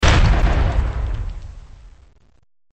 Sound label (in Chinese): 微型爆炸; LaboratoryProtection